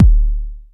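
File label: vermona drm1 mk3 bass drum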